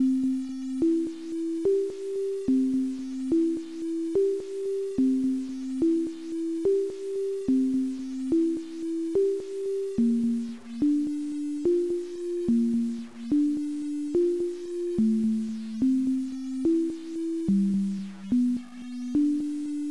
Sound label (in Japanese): BGM 3拍子 slow